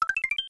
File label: 1-up; smw